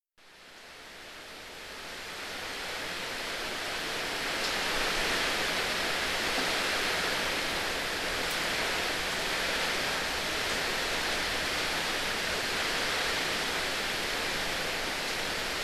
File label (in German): regenschauer